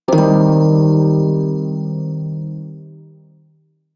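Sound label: Prime Shawzin Pentatonic Minor Chord D; Music; Warframe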